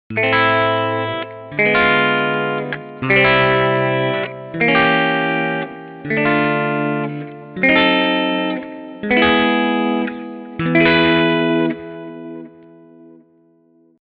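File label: chord progression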